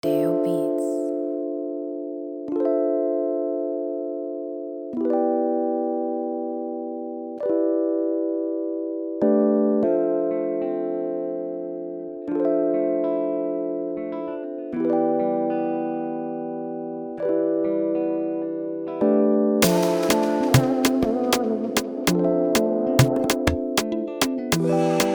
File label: Type Beat